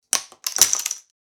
Darts dropping on floor

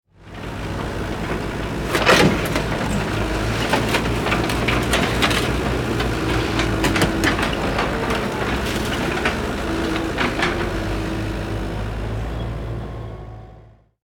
Excavator construction vehicle bucket move

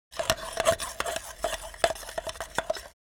Hand whisking plastic bowl with wooden spoon

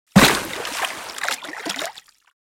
Swimming pool dive in with a splash

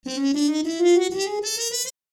Cartoon victory

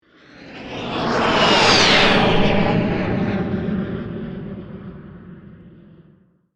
Missile flyby deep sonic whoosh

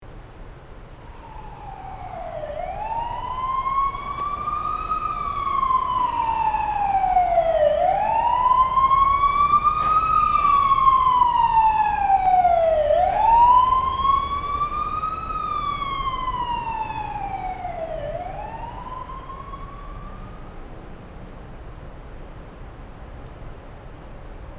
Ambulance emergency pass by siren on

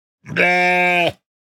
Goat bleating

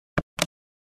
Sharp EL 8 old calculator single button press

